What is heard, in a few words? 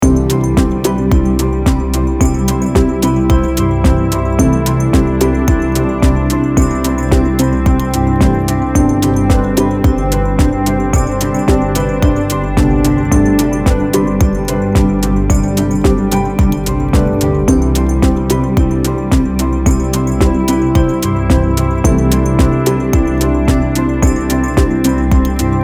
110BPM